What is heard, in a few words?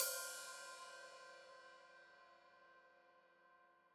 Hat Open, Hi-Hat; Deeply Sampled Drums